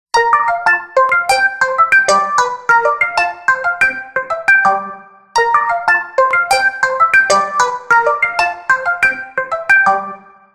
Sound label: Ring Snappy; Microsoft; Windows Mobile ringtones; Individual download-only (2008)